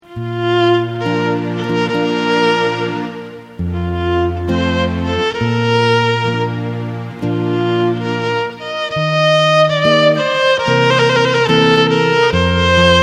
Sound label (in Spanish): Marcha Novia Guitarra Violin